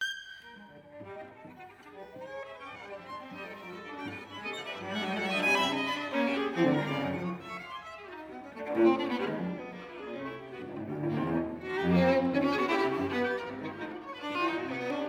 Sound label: String Quartet Presto